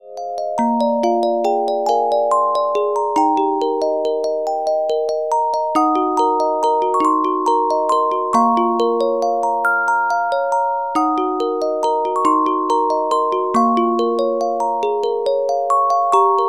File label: Carillon Music Box Melodies